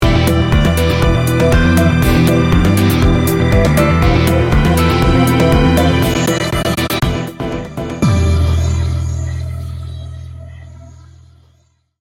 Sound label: upbeat electro-pop sting bumper